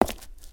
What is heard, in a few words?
Footstep Stone Outside; creatures pets